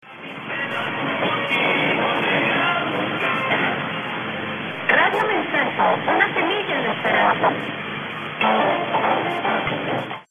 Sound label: broadcasting terrible audio